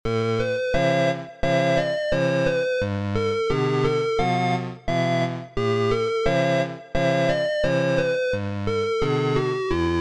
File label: haunted arcade